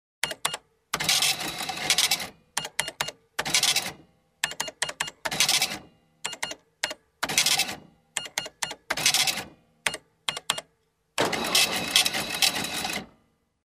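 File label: sound of printing at cash register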